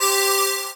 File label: SYNHT MiniDigi; SYNTH